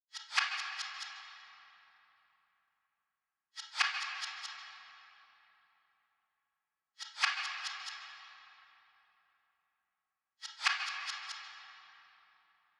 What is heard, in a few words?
140 BPM E Min Reverse Hihats; Cymatics; Spitfire; 2022 Melody Collection; Drill